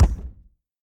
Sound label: reel Bounce; jumanji